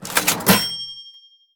Character Creator

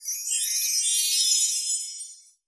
Ice Shard Wind Shard; Ice Shard Wind W Shard1

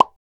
Pop; Smeshariki Kaleidoscope of Games

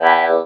Hit Voice; enemies; Moonface

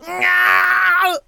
Enemy Generic Human Death Eaten

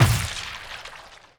Fluke Cast; Hollow Knight; Hero Fluke Cast

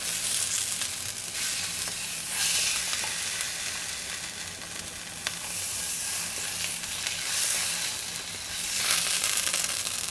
Cooking Sizzle Fry Burn Loop

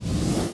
Quake Spell Prepare

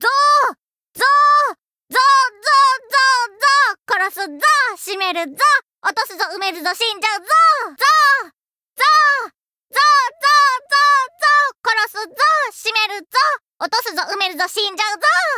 BGM RAP

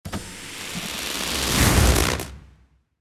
electric shock

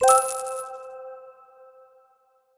Game Coin Win

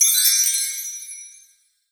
Chimes Magical Bells

